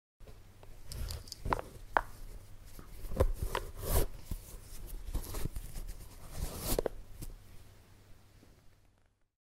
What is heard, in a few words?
tying shoe laces; بستن بند کفش